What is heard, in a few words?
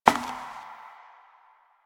Step Perforated Metal; Warframe; Koumei